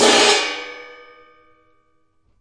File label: muted crash, drums single hits